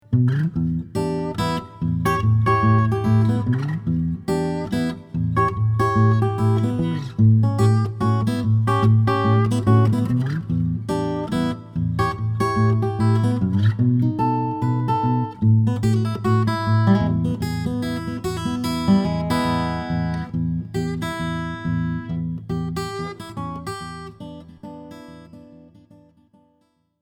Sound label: Instrumental